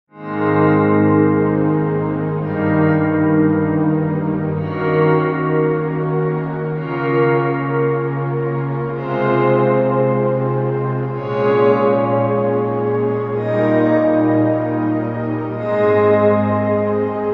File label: guitars in space